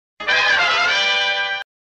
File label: Transition Comical Sound Effect; Batman